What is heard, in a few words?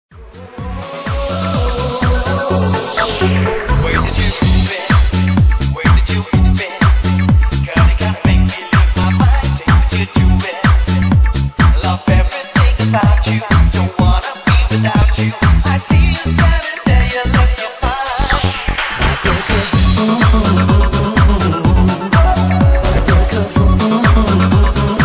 remix, club, ringtone; english club